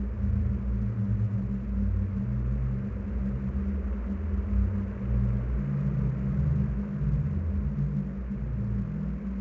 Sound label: ambient